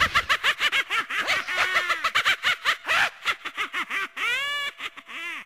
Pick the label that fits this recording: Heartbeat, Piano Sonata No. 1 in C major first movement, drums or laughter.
laughter